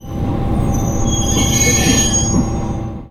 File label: Stop Break Snd